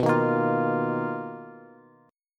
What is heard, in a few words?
B Major Sharp 11th, Bass, Bass Standard; BM#11; strummed; JGuitar